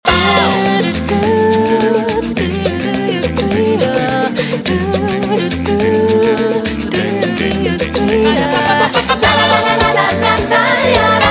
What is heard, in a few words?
Lalalatin, phonetones